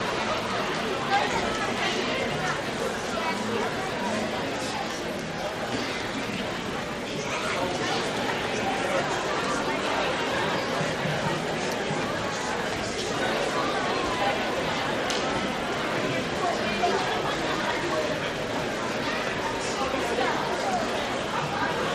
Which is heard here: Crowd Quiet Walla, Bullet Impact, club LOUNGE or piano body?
Crowd Quiet Walla